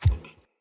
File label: Corrupted Battle Dog Walk Step, Foley Footsteps; Animals